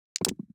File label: DICE on Felt, Throw and Roll, Standard, 4 Four Dice; Samples; ArticulatedSounds; DICE Sfx Party (Extended)